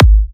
G#, kicks; markus hakala kicks

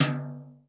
brush; Northstar - New Gold VOL-2; 50S BRUSH

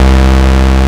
BASS, 160 BPM, Drum & Bass; Ueberschall; Drum & Bass Flash Trackz

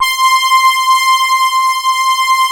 BELL RASS C5, Analog; USB Soundscan vol.49; Analog Fantasies; AKAI; PROPH

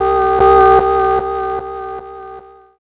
BLIP; Sonic Foundry (Sony Creative Software) - Rhythmicronics Processed Percussion

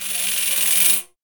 Tambourine; Sonic Foundry (Sony Creative Software); Infinite Soul VOL-2; Tambourines

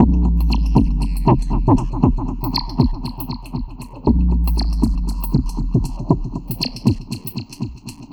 Drums Strange

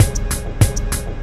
Loop Bit, Processed Percussion; One Shots; Sonic Foundry (Sony Creative Software); Rhythmicronics

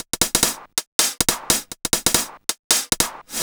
Loops 140 BPM Hihat Percussion Loops

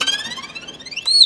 VIOLIN BIRDS; Best Service; World Colours

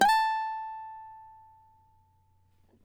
CAVAQUINHO SLIDE, Guitars; Spectrasonics; Hans Zimmer Guitars VOL-2

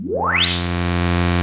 SWP ANALOG PAD; PADS; SYNTH FX

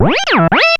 CLAV SEL; USB Soundscan vol.32; Roots Disco; AKAI

